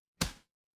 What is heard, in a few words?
retro game hit